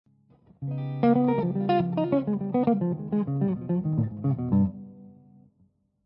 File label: Lydian Dominant Lines